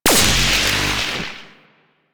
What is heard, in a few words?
Corpus Powerplant Tesla Coil Hit; Ambience; Warframe; Corpus Railjack; Tether Power Plant